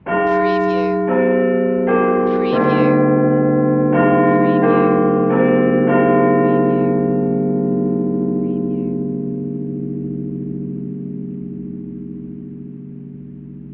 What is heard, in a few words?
Big Ben Half Hour